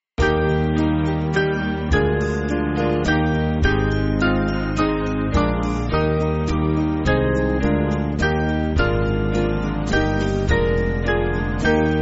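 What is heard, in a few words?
Band, Music Backing Files for Churches; Evening Hymn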